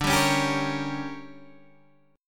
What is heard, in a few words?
D Major 7th Suspended 4th Sharp 5th, Guitar Open G